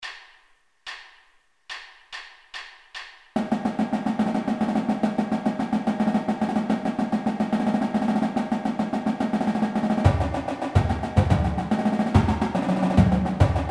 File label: trippy fast, drum music; marching; Warm-Ups